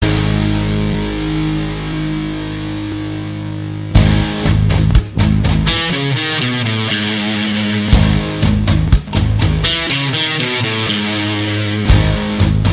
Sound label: arabic metal